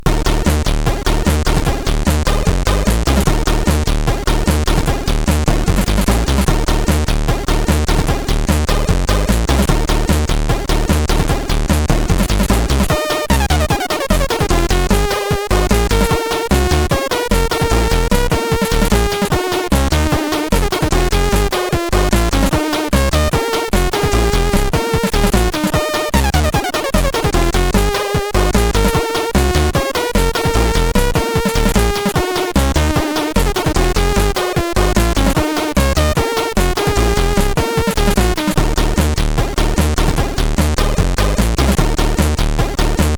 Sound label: Intro Tune, CSG8580R5